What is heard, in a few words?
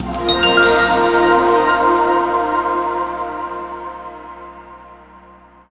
Crystal Get